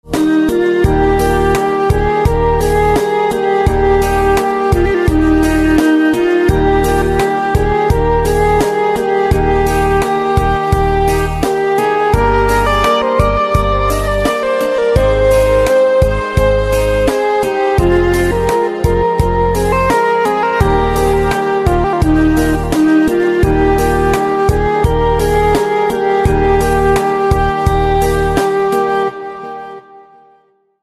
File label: Instrumental Ringtone